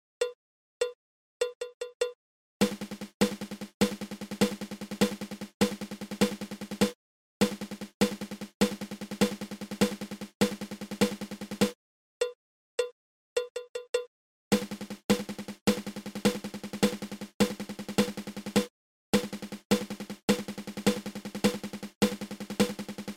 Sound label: Double Paradiddle